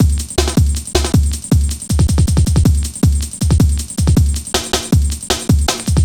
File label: Drum Loops, 158.5; Jungle